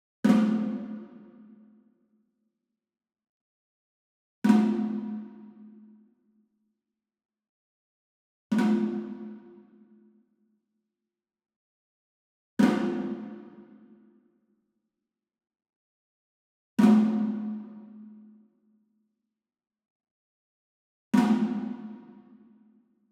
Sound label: SMALL DRUM Tambora Flam Hard Beater Far; boom library; Cinematic Strikes Construction Kit